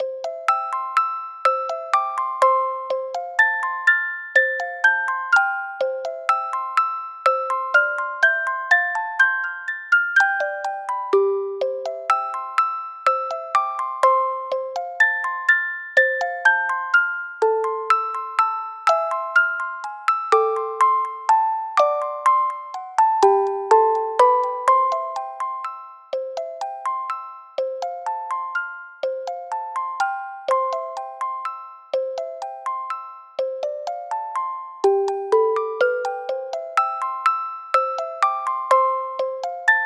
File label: Wandering (Music Box)